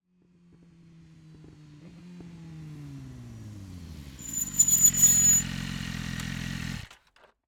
BMW S1000RR EXTERIOR Approach Slow Decelerate Stop Idle Shutoff, 4-Stroke 999cc; 2015; Dramatic Cat